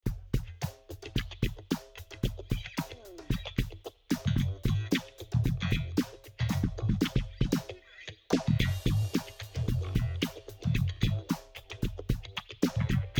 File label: funk filter